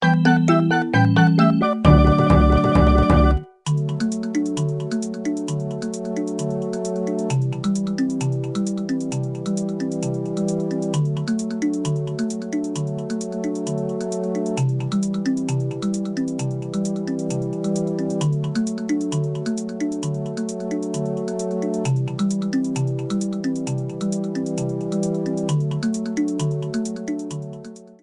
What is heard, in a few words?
Sky Area clear theme